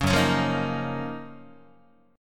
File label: Gb Major 13th Gb down Guitar; GbM13 strummed; JGuitar